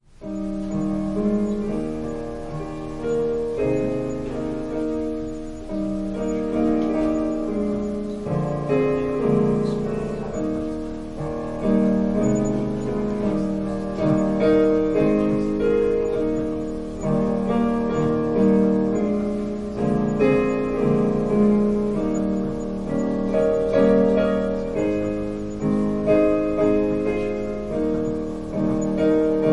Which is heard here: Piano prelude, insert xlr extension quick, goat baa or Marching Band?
Piano prelude